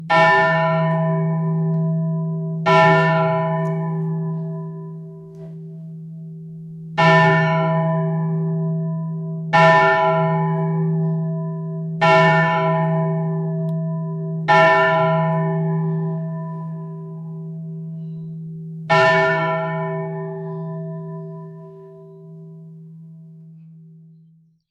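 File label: church bell tolls 12 times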